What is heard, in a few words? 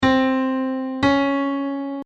Interval 8